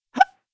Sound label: Hiccup